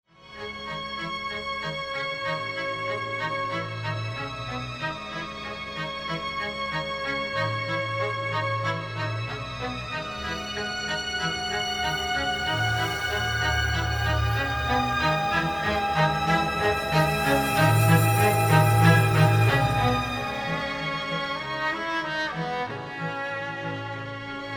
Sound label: instrumental